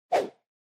Motion Swish Single; モーション スイング; 単音

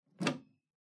Public Phone Tubular Key Remove

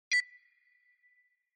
Countdown

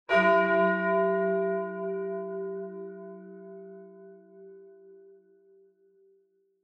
Church Bell High Short

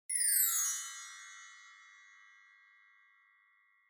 Wind Chimes, Gentle Down Low

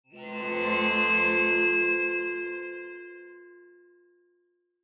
Cyber

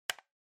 Clock Alarm (Button)

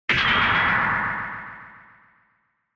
Retro Anime Robot Long

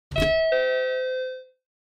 Ticket Gate Alarm Timbre1 Flap

